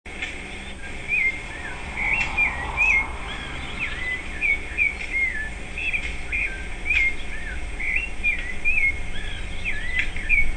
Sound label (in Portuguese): laranjeira, cantos sabias; ilhazinha